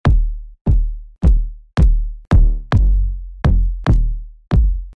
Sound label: Thump Kicks